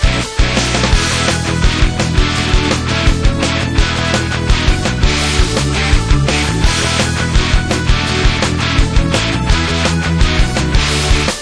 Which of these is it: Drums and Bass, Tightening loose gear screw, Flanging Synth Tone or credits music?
credits music